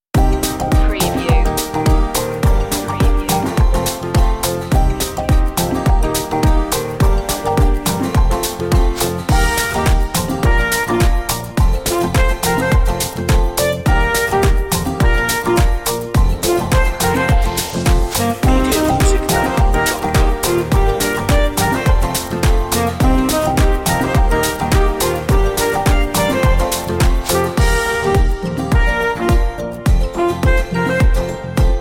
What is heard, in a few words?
island beats